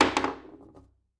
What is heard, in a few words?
Foosball Ball Drop